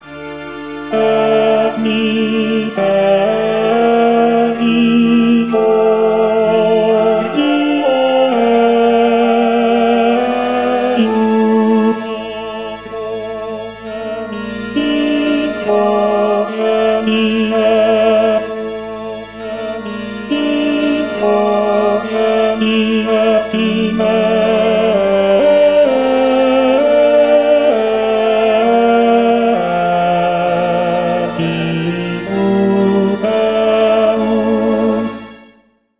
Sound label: ten et misericor